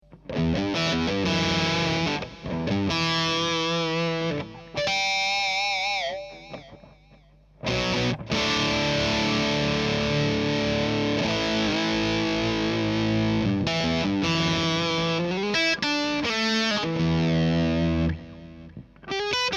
Dist Delay

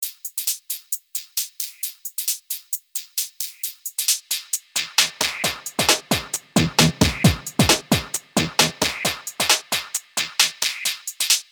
Loop 12dB HP Filter; EXS

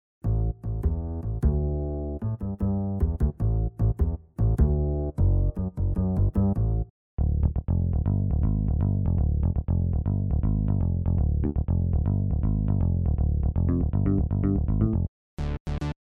Bass

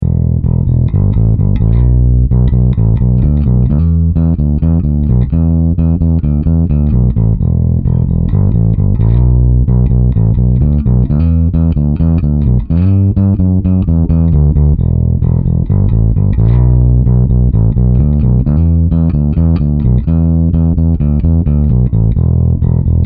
4 Finger Gain Half

